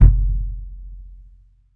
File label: Kick Band; SSO Kicks